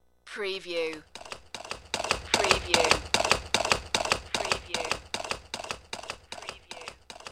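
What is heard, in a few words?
Horse Galloping By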